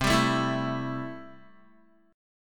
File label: C 6th, Guitar; strummed; 8,10,7,9,9,x